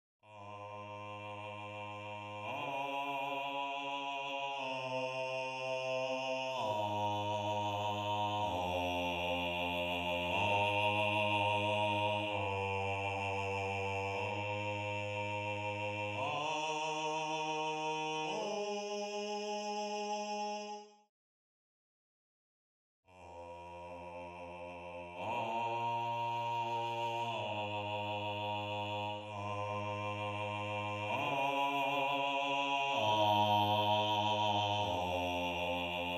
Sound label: Bass